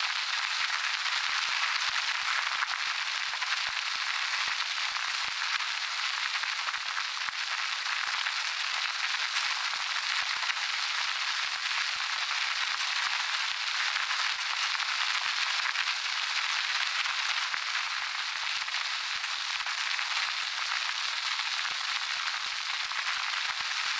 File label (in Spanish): RODADURA GRASS YBR, YBR125